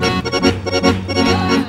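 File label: accordion groove; Best Service; World Colours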